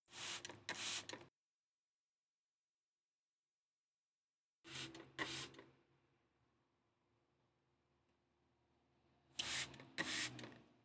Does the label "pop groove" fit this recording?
No